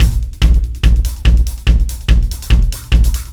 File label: Beat, Loops